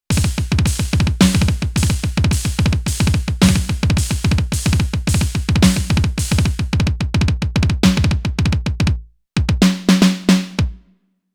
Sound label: elektron analog rytm acoustic drums